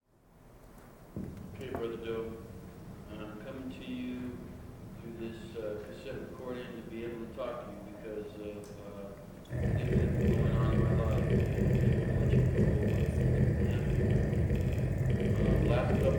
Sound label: noisefloor